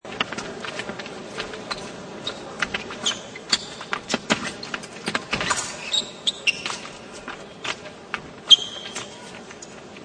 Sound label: Fencing, Two swordsmen duel; Sword Sound Effects; Swords (Sound Effects:Combat)